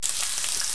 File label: fry; WARPATH